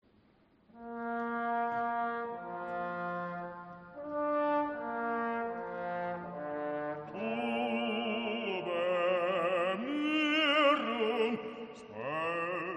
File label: Tuba mirum, Requiem Mozart; Concerts Requiem de Mozart; en l'église de Montferrand